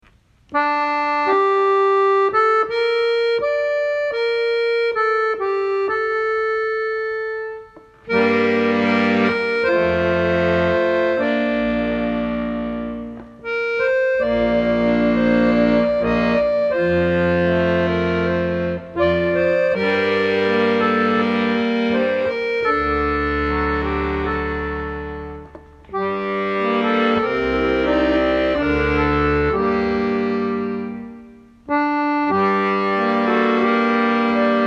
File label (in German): Akkordeon Arrangement; Maria durch ein Dornwald ging